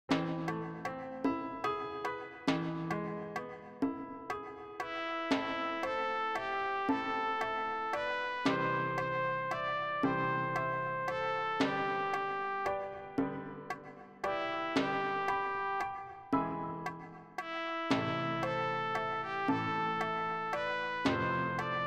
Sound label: slowest click